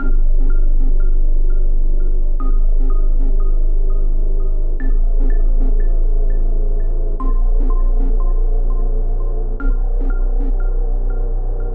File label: Suspense Loop